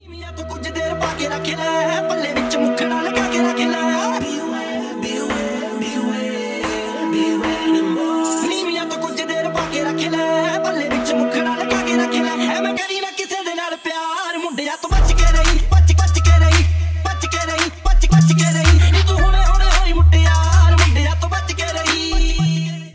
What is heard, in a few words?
Punjabi Ringtone